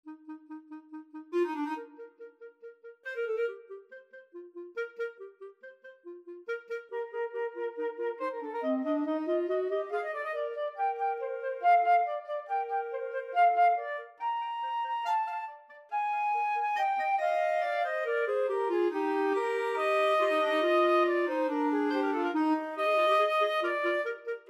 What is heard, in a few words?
magic flute overture, flexible mixed ensemble 4 players